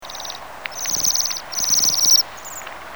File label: Bombycilla garrulus